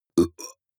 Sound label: burp short; soundpool